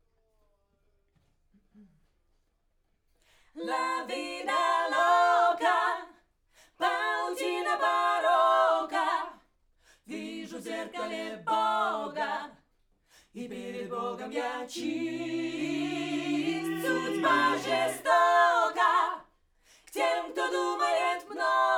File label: Backs; SCHOEPS